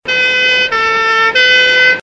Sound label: ambulance siren; city